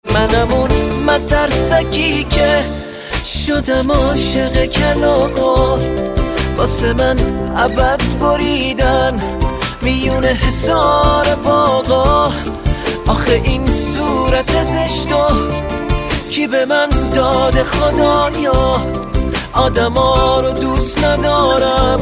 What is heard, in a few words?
matarsak ringtone